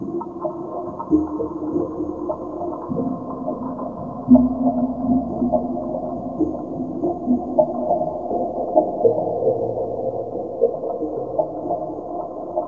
sub aqua, sub aqua synth; Zero-G Ambient 1

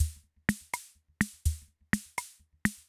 Loop, rhythm king; classic machines

